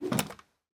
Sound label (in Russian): zariad arbaleta minecraft